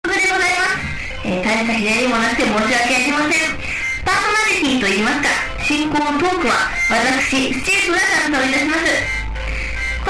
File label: echo